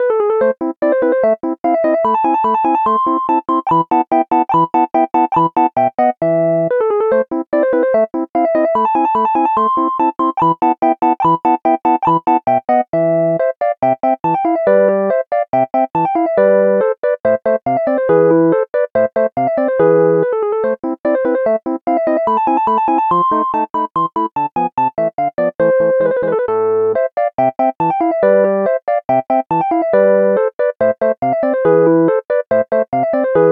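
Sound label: rhodes electric piano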